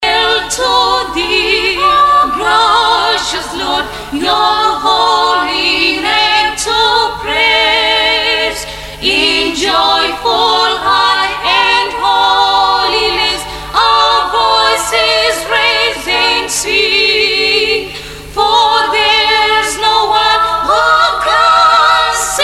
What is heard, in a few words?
Choir; Kurasini SDA Choir